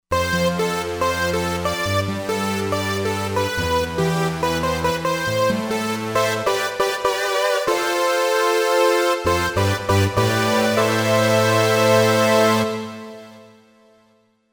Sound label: Super Saw Slow